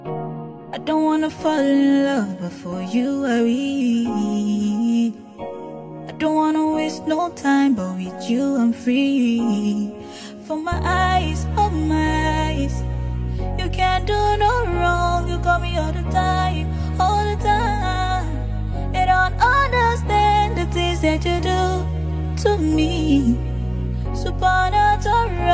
Acoustic Version

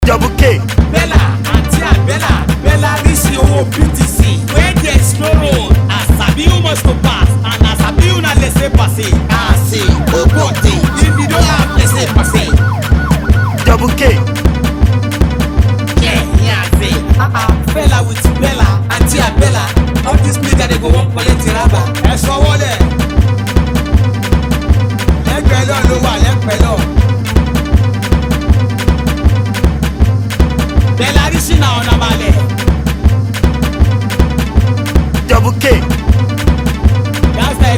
Beat

